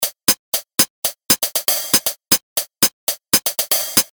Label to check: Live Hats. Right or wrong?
Right